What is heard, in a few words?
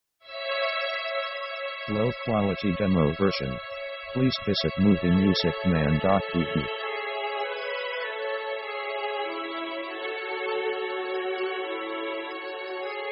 orchestra melodramatic; orchestra themes